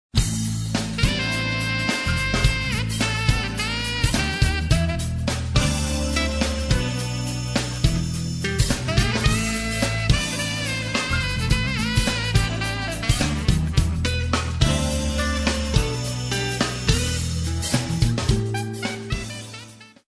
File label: jazz funk